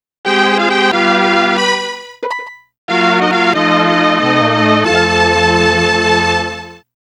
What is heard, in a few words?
GAMEOVER (Game Over)